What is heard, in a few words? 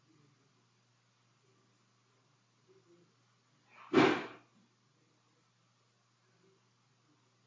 Empty Room